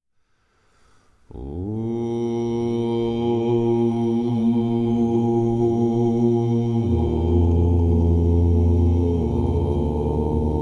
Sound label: Om Ah Hum Benza, Mantras